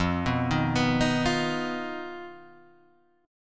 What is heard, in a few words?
D sharp Suspended 2nd Sharp 5th F